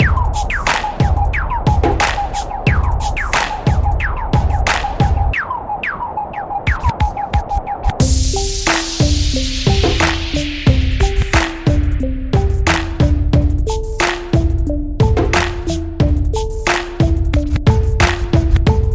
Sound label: modern beat ringtone